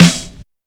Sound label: small reverb snare drum sound f key, Royality free acoustic snare tuned to the F note.; snare-drum-samples